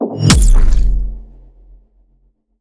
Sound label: synthesized impact; Sinematic 2; soundmorph